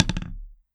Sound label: Practice Pad Buzz Stroke